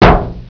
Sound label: drum